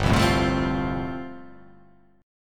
G 7th Suspended 2nd Suspended 4th

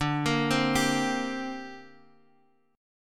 D 7th Suspended 4th Sharp 5th, D

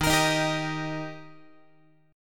D sharp Major D sharp Guitar, chord; strummed; x,11,13,13,11,14; JGuitar

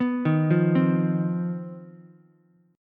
C Minor Major 7th Double Flat 5th C down Ukulele; arpeggiated; JGuitar

